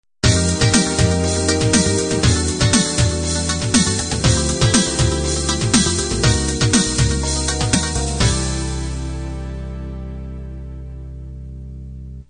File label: 8bt analog end